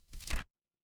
paper fabric page turn

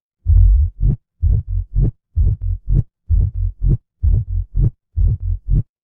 ui loading

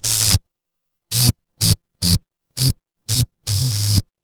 fire electric zap

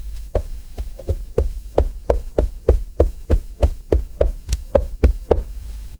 footsteps wood

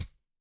impact metal hit

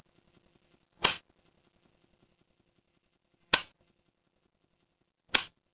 mechanical switch

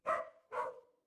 animal dog bark

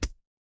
impact punch

